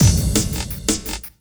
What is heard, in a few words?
LOOP, Drum & Bass, 170 BPM